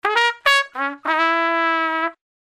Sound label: brass loop